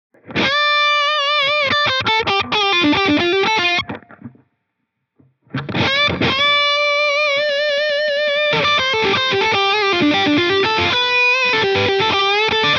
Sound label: Delay Reverb Plate